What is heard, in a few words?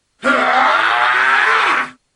backstab hyaaaa; superheros; ironman